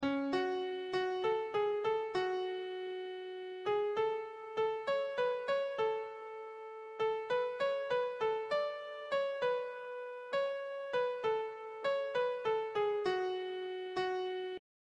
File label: Piano